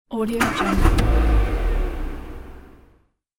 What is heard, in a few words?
small car almost ignition; urban sounds